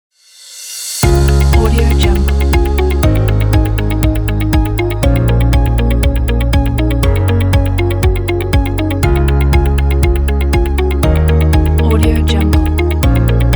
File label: ambient corporate